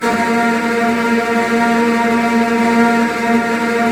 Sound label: Virtuoso Strings, TRM FX